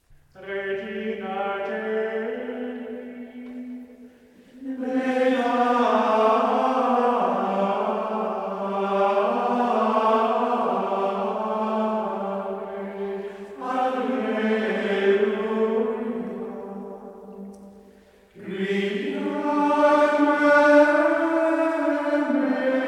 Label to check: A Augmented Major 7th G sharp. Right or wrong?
Wrong